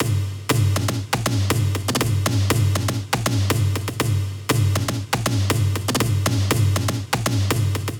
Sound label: machine tribes loop main hits